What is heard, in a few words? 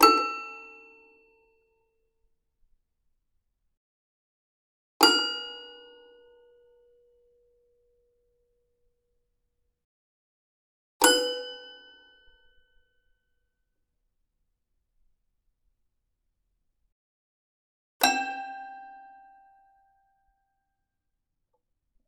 piano